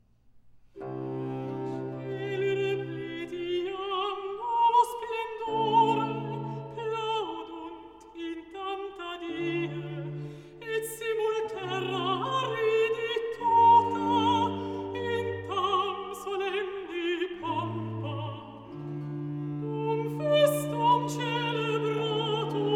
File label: Recitativo; Clarae stellae, scintillate RV 625